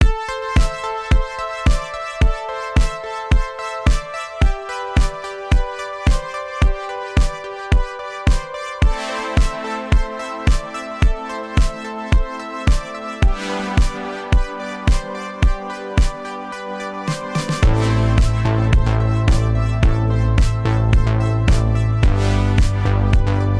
Chillwave

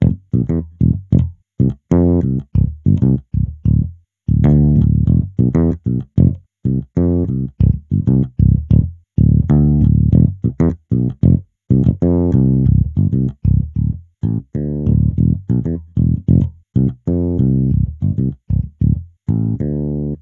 Elec Bass

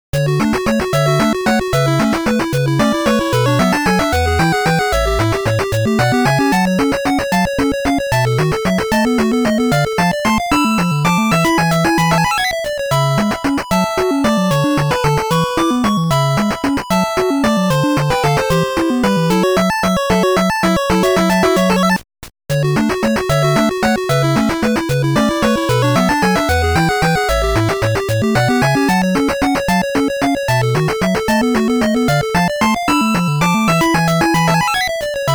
Stage Music; Armadillo; NES

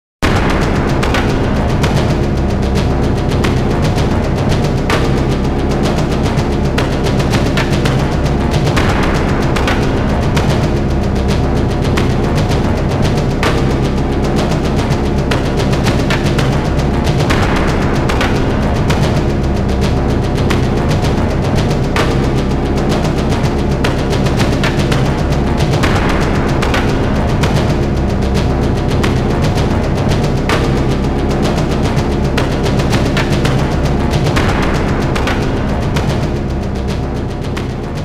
Warning; Pachi-Slot Biohazard Original Soundtrack

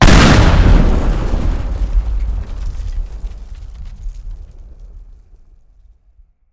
explosion

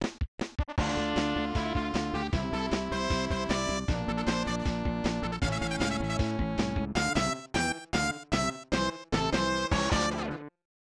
Game Set; Super Kyuukyoku Harikiri Stadium; SNES

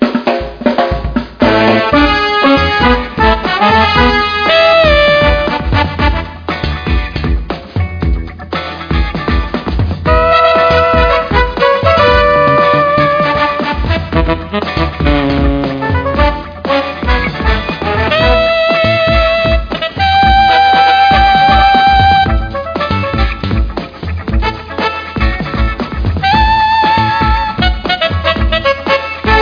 BGM Menu BGM; Touch de Rakushou Pachi-Slot Sengen Rio de Carnival

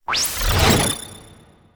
Appear

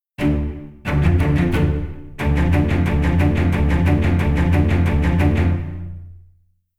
Combat In; The Witcher 3 Wild Hunt; Novigrad

